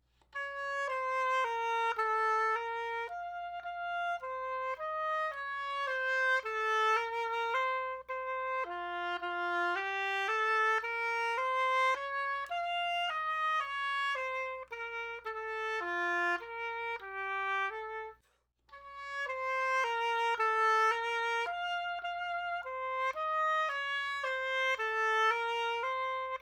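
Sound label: Oboe Solo; Tchaikovsy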